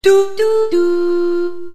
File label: startwin